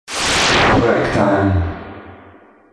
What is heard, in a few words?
laser, jingle